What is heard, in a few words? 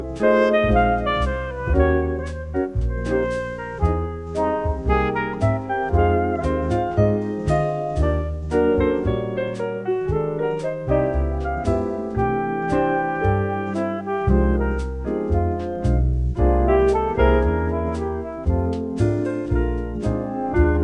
christmas jazz